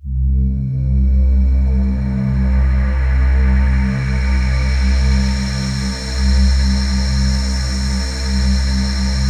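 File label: PAD, atmospheric pads; Best Service; XX Large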